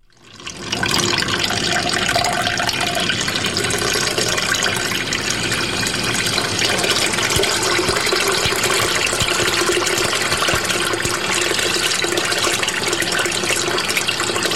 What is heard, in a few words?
peeing